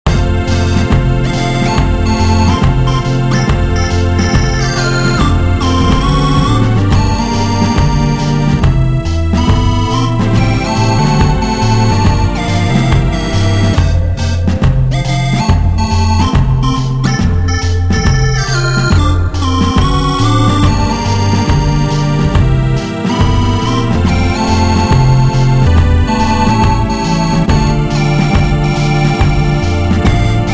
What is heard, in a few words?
jazz